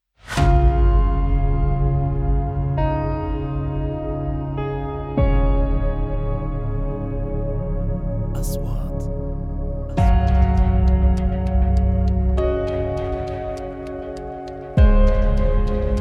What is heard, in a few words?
advertisements